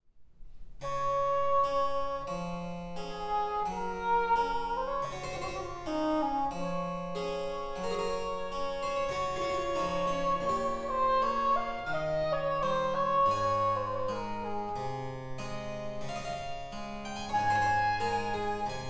Sonata in D major for oboe d'amore and harpsichord after BWV 1028 adagio; Bach; Trascrizioni per oboe